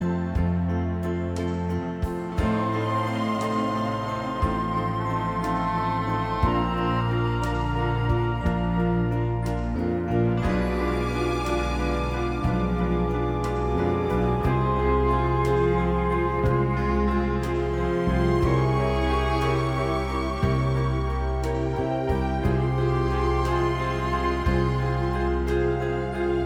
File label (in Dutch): instrumentaal